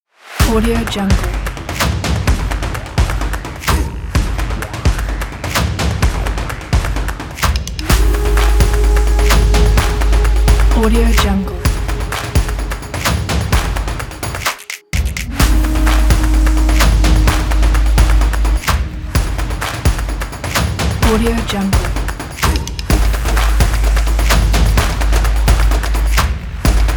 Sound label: action percussion